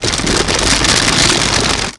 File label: slime